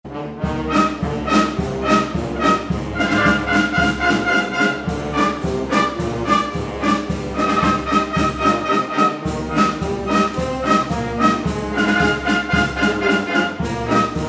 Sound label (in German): Feuerwehr Marsch; Musikkorps Herold Pye